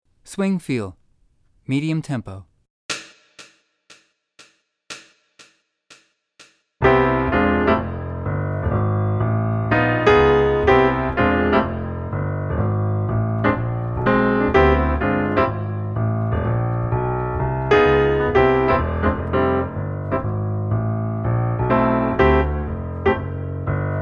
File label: swing